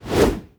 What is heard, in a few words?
BOSS Atk